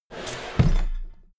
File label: drawer cabinet equipment close heavy halfway